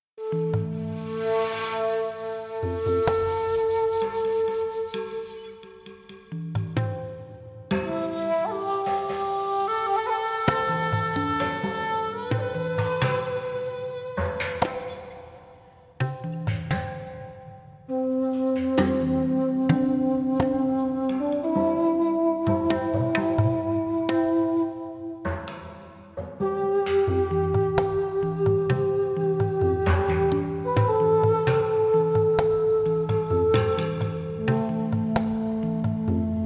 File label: earthrise ambient music